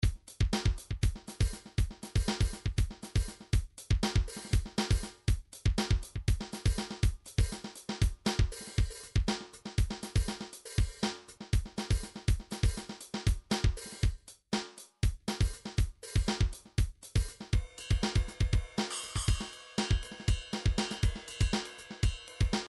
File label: midi drums percussion; Midi Vol 4 Drums & Percussion